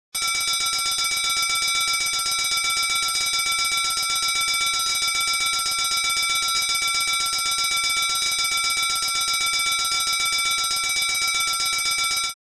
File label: Fire alarm bell emergency steady ring long